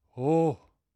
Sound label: Startle; White Servant